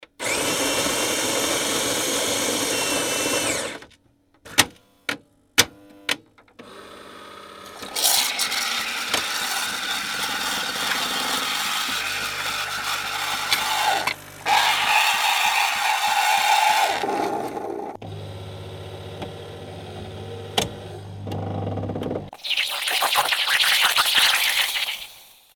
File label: cappuchino machine